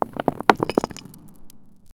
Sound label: ancient